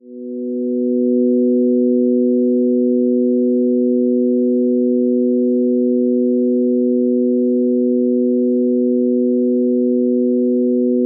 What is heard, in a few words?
music motivational pulse